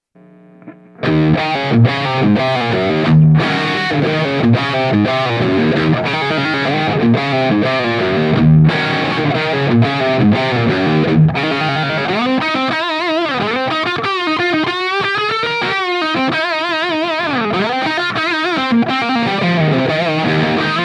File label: Mountain Of Tone